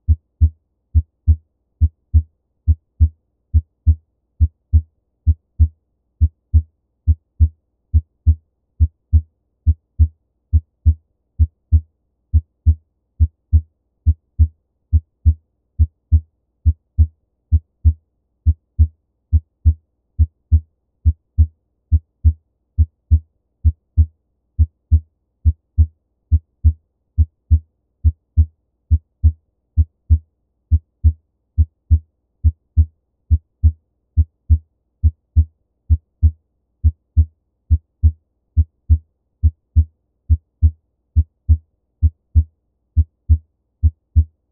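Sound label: Pulmonary, Single S2, Supine, Diaphragm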